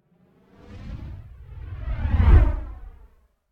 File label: Whoosh Space Passing Phased; Hollywood Edge; sound designer's toolkit v2